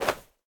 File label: pwr sm fire cloth; smite; pele